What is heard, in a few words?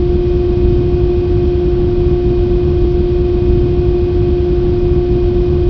fan; rats caravan